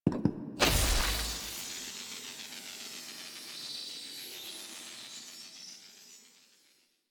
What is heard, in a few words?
Globelight On; Warframe; Zariman; Epilogue